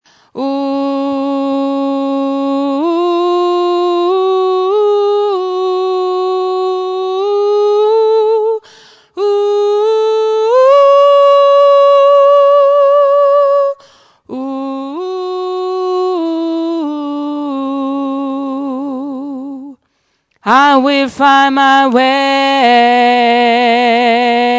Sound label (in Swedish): sopran